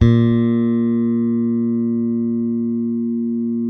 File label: bass sustain A#2; Sampleheads; Will Lee Bass Library